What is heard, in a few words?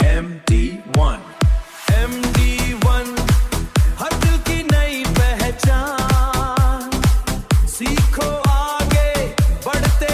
EDM Ringtone; MDI ONE